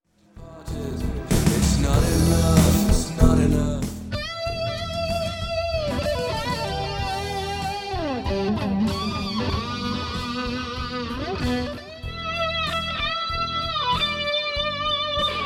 solo tone build

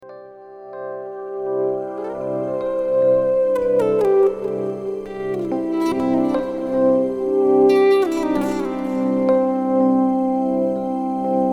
isolated e bow guitar

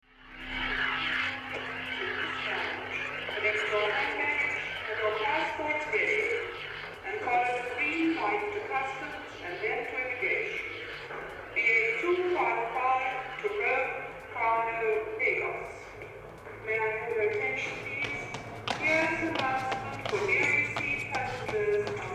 On the Run Sound Effects

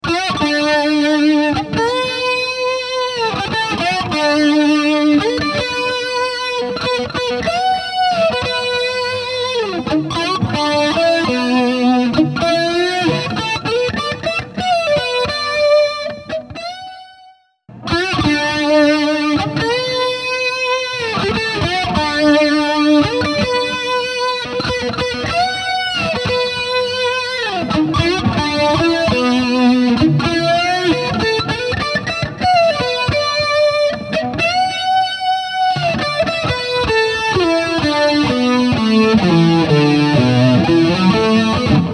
Big Muff only then TD in front of Muff

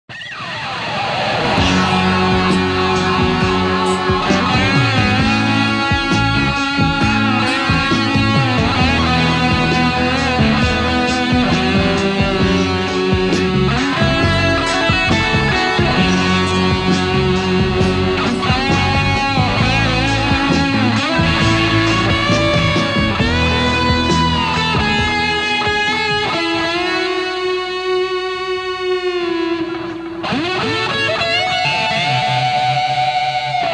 Solo, Rat; SSL5

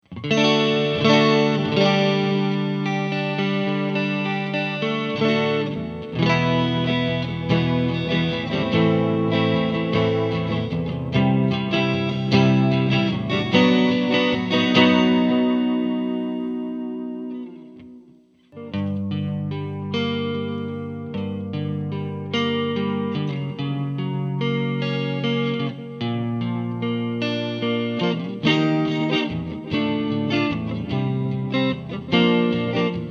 Clean

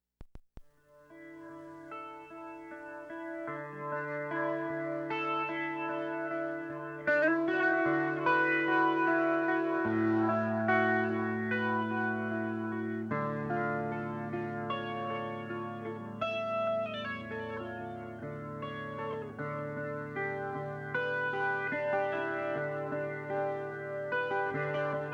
just and his guitar

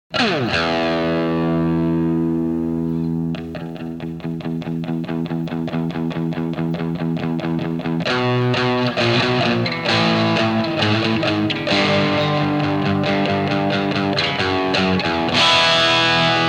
Tone with Tube Driver; Selmer

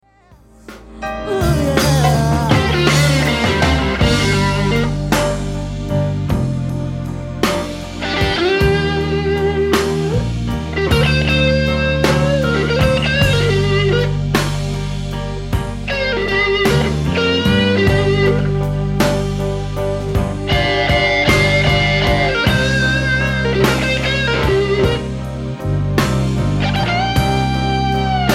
Solo

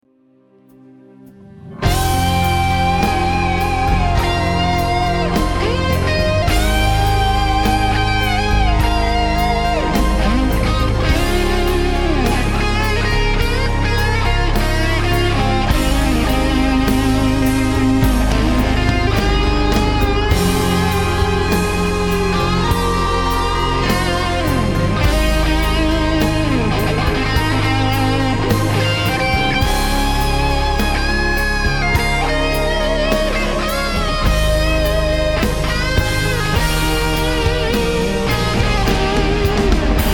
Solo Sovtek Big Muff, Live Broadcast; Wroclaw, Poland; Sovtek "Civil War" Big Muff; Live broadcast 2016

